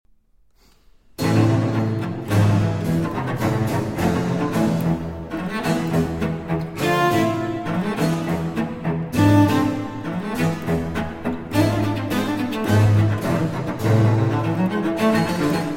Sonata For Cello & Continuo in A Minor II. Allegro